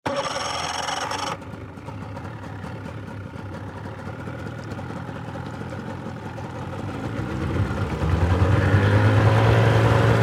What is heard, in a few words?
Dive Raft On Rev Off, Transportation, Watercraft, Dive, Raft, Start, Up, Rev, Engine, Steady, Shut, Off; Boat Sound Effects; Blast Wave